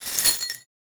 Money Glass

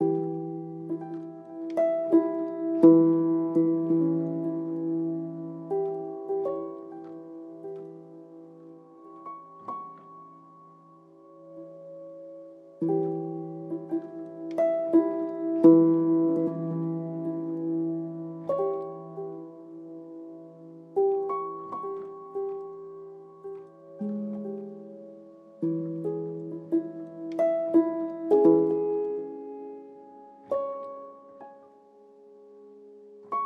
Short Piano Slow Mood Atmo

